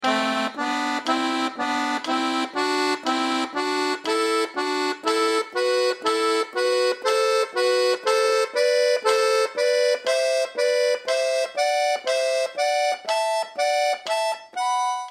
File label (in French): gamme synthe sol tierces simult vite; tiré; Par tiéres simultanées normal par 3 et tout en tiré